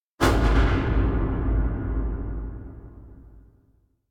Impact Metallic Tension - Long Tail; Hollywood Edge; sound designer's toolkit v2

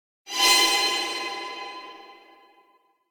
Impact Metallic Medium Moaning Impact - Main; Hollywood Edge; sound designer's toolkit v2

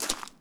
gravel jump; firefight

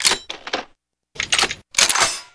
Gun Reload Weapon, Weapons Foley; SFX Kit

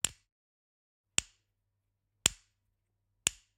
Generic Button Wood Tiny; boom library; The Interface

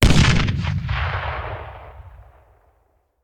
Grenade Hand; Sound Ideas; Guns

